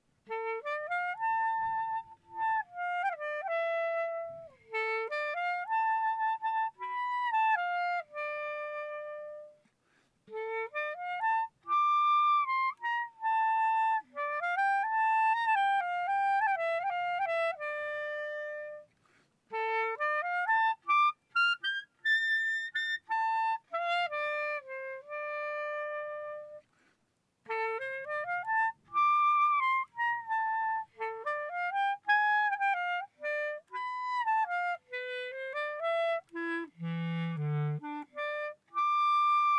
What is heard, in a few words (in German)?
Stand Ext Hoch Ton Tief Spiel